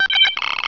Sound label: LOG ON